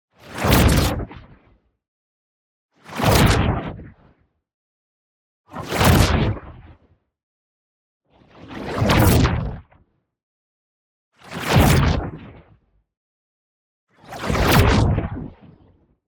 WHOOSH MEDIUM Sudden Burst; boom library; Matter

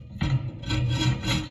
Entrance Door Bar

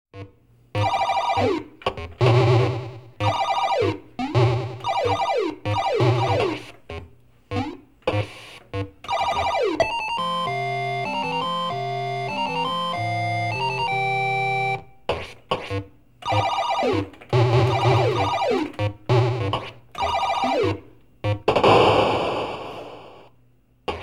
Video Game General Atmosphere; KMP Film Video Sound Effects

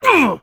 Player Life Lost; AudioWizards

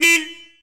68 Road Runner Horn, Automobiles

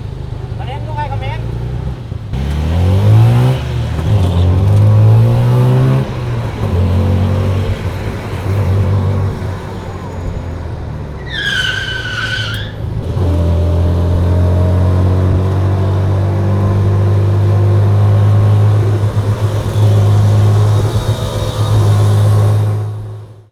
cars ambi squeal wheel, street traffic; best service Blue Box

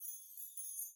chimes; Blind Drive

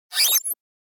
Button Tone Short Sci-Fi Creak; Hollywood Edge; sound designer's toolkit v2

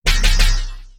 blast release; Apotheorasis

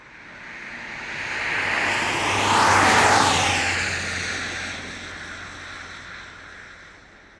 car drives wet; best service Blue Box

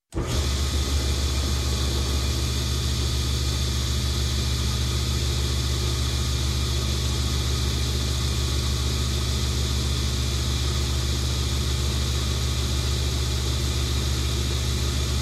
Construction, Saw Table Saw Start, Run, Stop; Sound Ideas 2000 Series

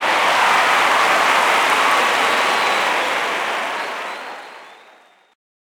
Crowd Cheer; Pitch Black Audio Pong

